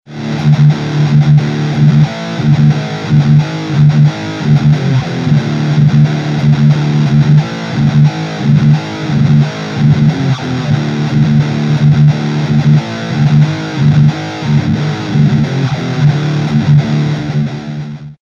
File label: MT2 norm, Effet guitare; MT2 Modification; C'est la MT2 modifie